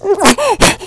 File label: gasp; hamster